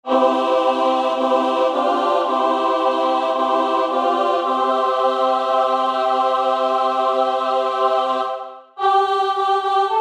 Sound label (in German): Tutti; Jauchzet; Andante Con Moto